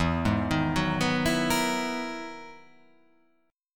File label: E Major Sharp 11th E